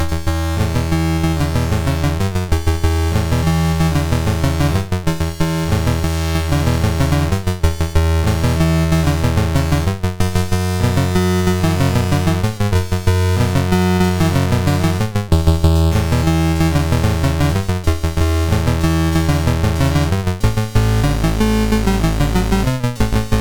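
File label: Magicians Ball, MOS 6581 SID chip music, games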